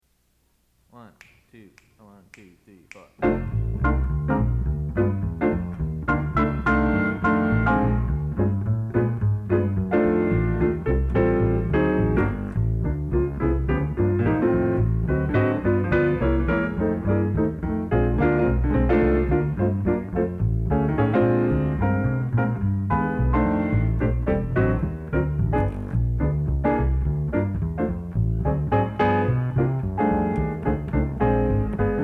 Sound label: drums f blues; Jazz Studies Auditions